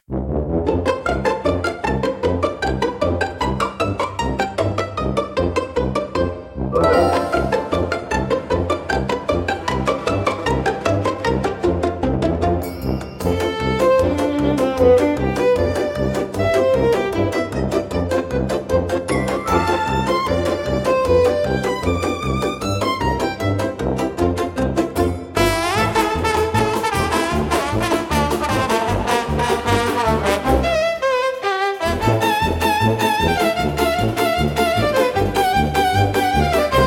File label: Tuba Tumble Chase, comical orchestra for slapstick gag scene